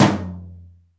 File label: MPC1 TOM; Crypton - DrumBase5000; MPC DRUMS